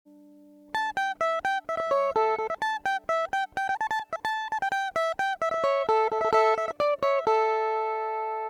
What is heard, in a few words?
mandolin comparison electric srml